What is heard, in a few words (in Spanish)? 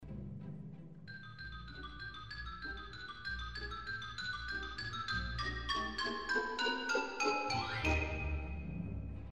xilof; britten